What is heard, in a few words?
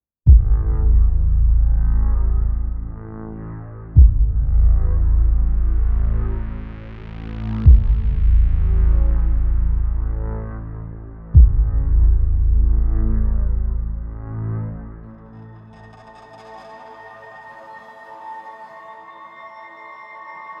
Horror; Chernobyl